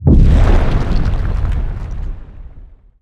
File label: comet impact; dota; crownfall